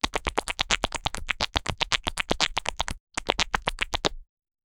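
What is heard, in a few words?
soft cartoony rubbery footsteps; simulation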